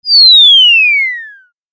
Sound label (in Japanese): 落下